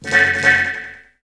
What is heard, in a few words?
Hip Hop R n B FX; USB Soundscan vol.19; AKAI